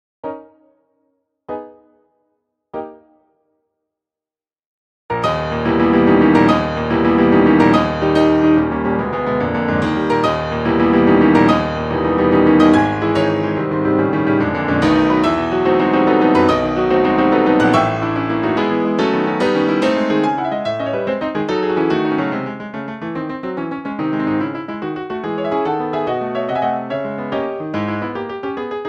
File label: Prelude